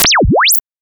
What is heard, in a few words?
tuning